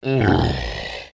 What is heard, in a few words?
monoculus stunned; npc